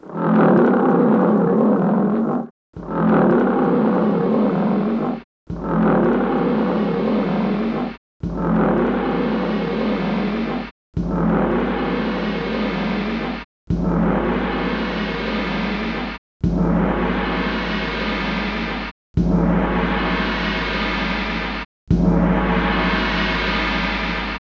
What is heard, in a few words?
repmorph Lion Gong